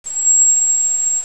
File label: trumpet